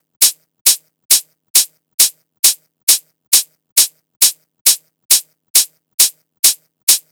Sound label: Hat Loop 135, Techno